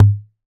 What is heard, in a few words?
Tabla Ge; Roland BK-5; Percussion